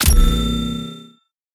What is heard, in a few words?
uav laser